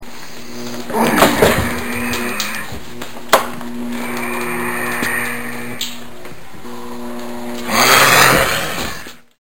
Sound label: monster